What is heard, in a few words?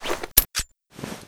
detonator